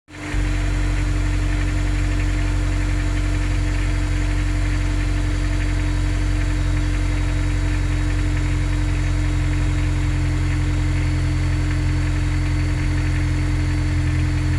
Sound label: engine sound; s2000 under hood; s2k engine; another what engine sound question